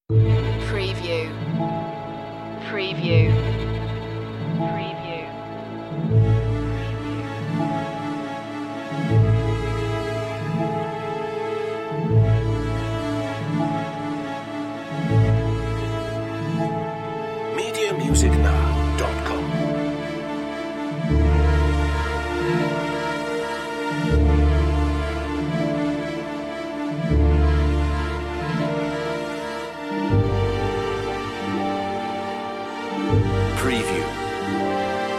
lake waltz

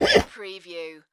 Horse Stallion Neigh; Sound Effects Bible

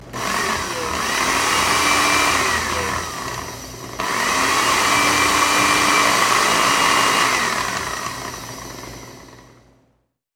Food Processor

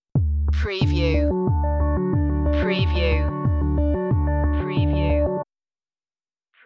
corporate sooth stinger

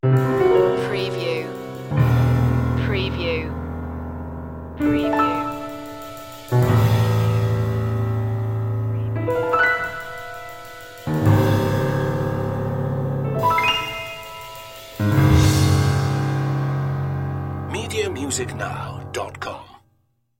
piano atmos

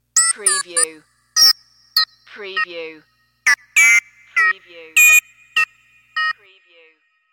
High Creepy

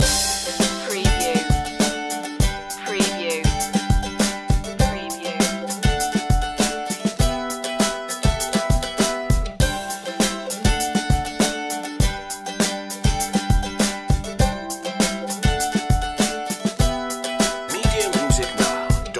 family tree loop

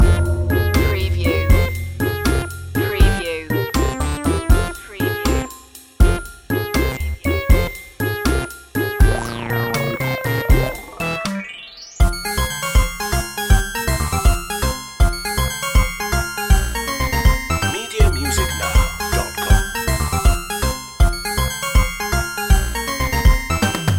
retro video game lazy world loop

